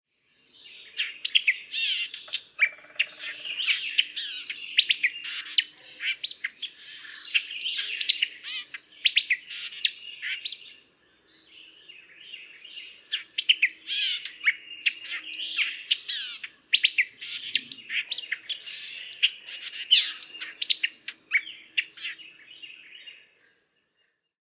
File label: Vireo White Eyed Long Song